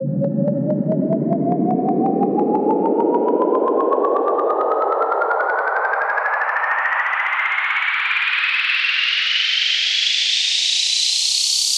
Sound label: Loop And Noise